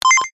science fiction button press plastic button click and digital beep; zapsplat